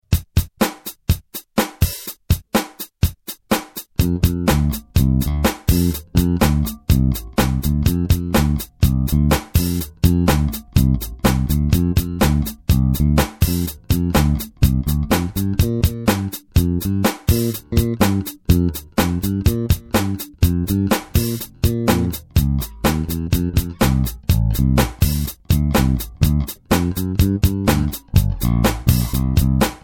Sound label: instrumental